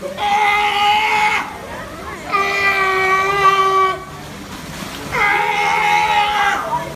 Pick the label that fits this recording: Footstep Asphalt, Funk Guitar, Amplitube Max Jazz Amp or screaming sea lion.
screaming sea lion